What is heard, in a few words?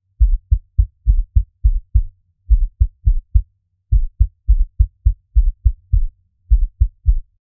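warm but serious atmosphere, minimalistic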